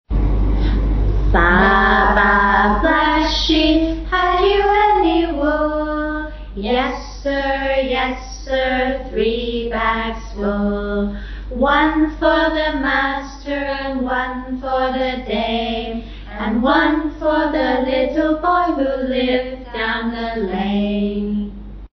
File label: Baa baa